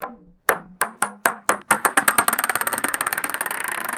plastic ping pong ball bouncing and settling

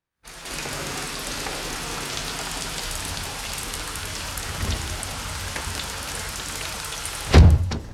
sliding glass door closes muffling heavy rain

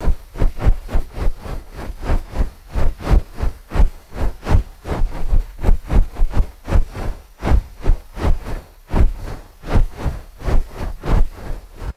rhythmic heavy cloth wing flaps

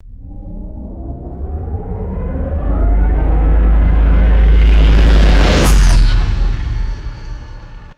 dark cinematic riser to metallic impact

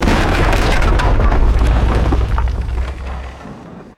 medium explosion with splintering wooden debris; explosions

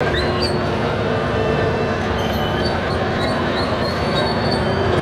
heavy mechanical ride squeaking and humming; machines

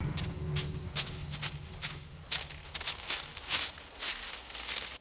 footsteps crunching through dry leaves; foley

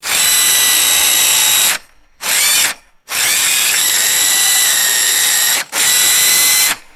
small electric drill revving in short bursts; tools

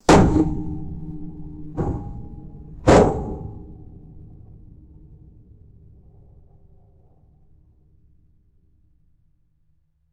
heavy reverberant hollow metal impacts

